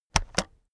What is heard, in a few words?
keyboard external space press slow heavy